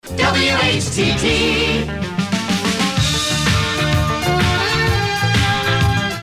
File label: air check jingle; whtt